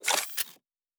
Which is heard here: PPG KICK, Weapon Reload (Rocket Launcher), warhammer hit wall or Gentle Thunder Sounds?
Weapon Reload (Rocket Launcher)